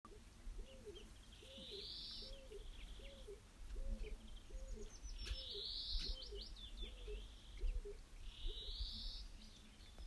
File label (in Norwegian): skogsdue